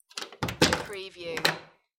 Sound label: Wooden Door Close And Lock; Sounds of doors and windows opening and closing, keys locking / unlocking doors